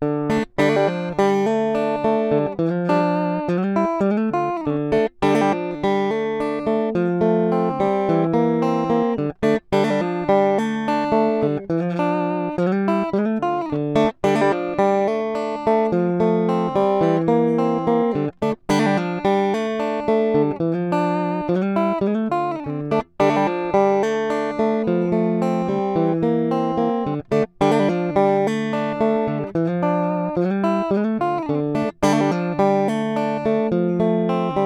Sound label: original strat